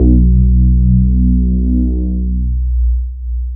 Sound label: bass, synths